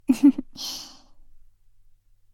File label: laugh, ASMR; Garden; WithRin-Nazo01